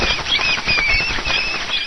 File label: squeek hunt; sven